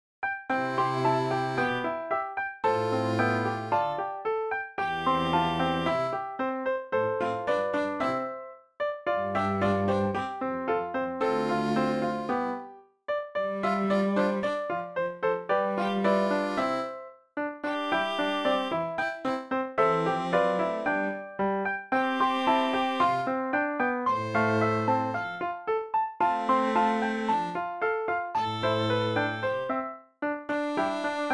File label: Piano Trio